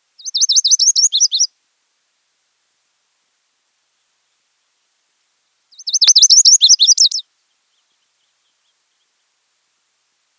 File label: Lazuli Bunting